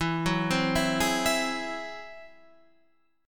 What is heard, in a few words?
G sharp Augmented 7th, chord